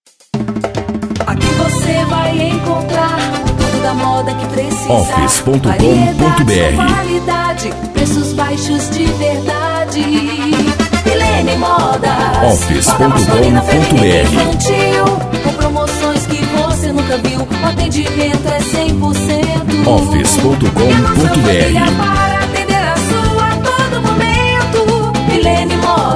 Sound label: jingle